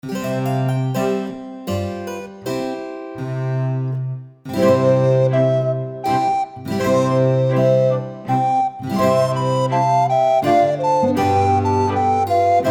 Menuett, Floetentoene; Jede Menge Floetentoene 1

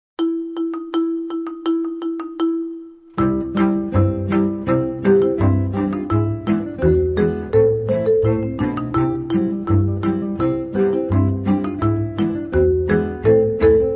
Deutschland, Accordion; Der Querenburger

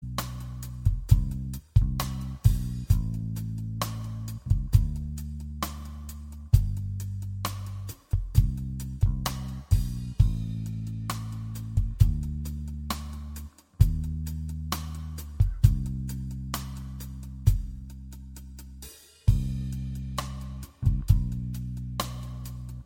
Romantic Pop Prelude, Akkordeon; Akkordeon gefaellt mir 2

